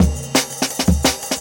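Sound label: Jungle Breaks, 170 bpm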